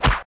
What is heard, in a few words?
arrow; Palm OS; EDGE